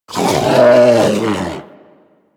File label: Predator Vocal Alerted; Warframe; Hunting Critters